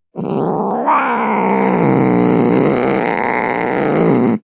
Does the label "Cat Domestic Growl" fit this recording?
Yes